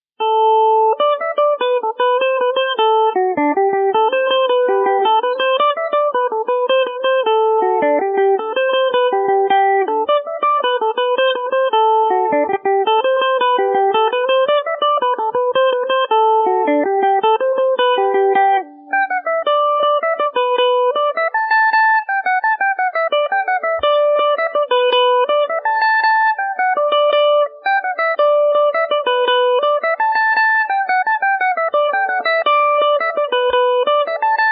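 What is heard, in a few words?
D mixolydian, Irish mandolin; mollie mccarthys no 1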